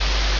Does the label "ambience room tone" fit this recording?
Yes